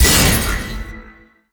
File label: ink emission; the monster plane v2; kolmods skill